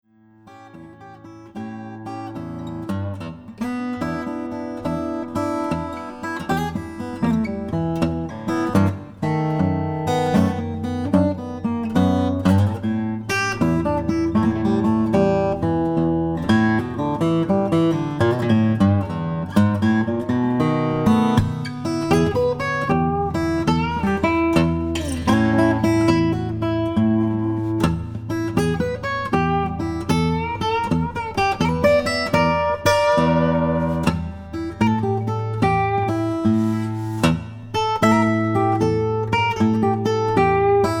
Slow Blues, guitar

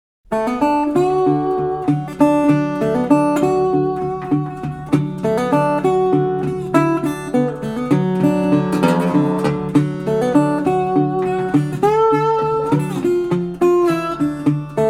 Bottleneck Rag, guitar

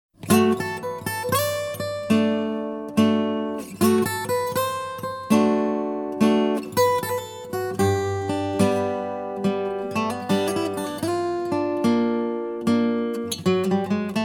Slow Blues in C

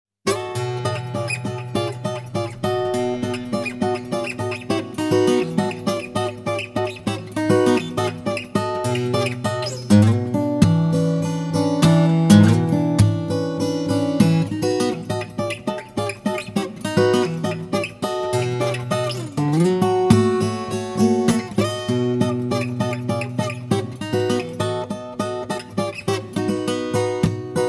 Delta Tradition In A

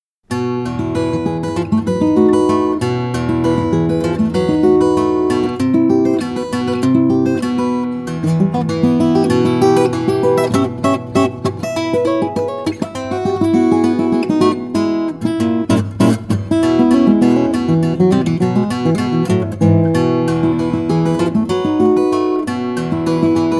Maple Leaf Rag, guitar

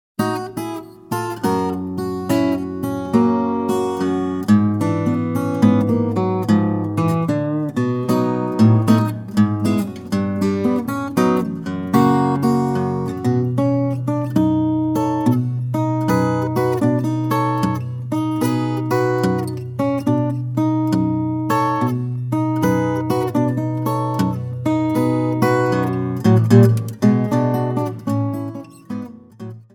Mississippi Blues

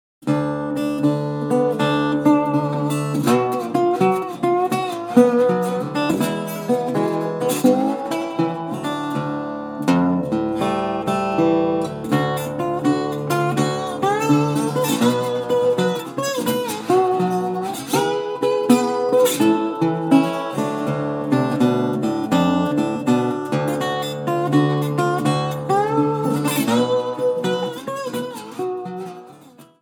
Blues, guitar

